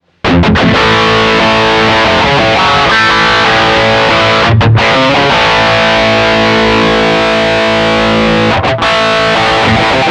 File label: Marshall Guv'nor Plus, fet 800 eq output; jcm800mv